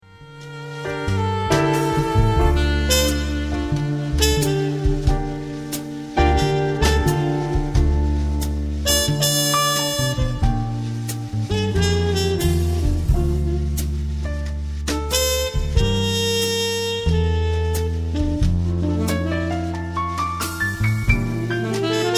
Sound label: Jazzy